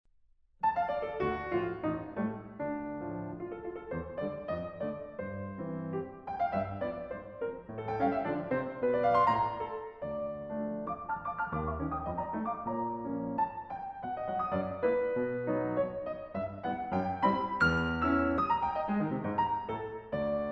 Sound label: Preludes in G-Sharp Minor